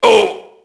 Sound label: merc get hit; Max Payne